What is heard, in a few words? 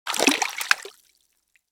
Fish jumping out of water